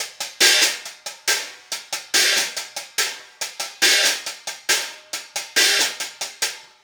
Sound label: Hi Hat Pattern